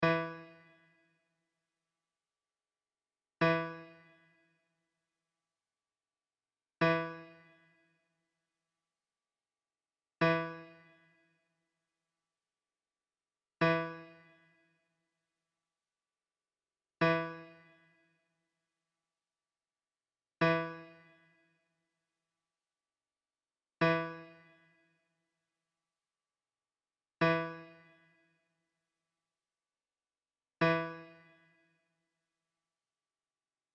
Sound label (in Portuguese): amostra de pulsos com periodicidade